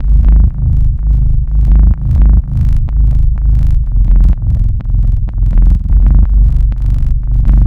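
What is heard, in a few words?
Bass Loop 125 Gm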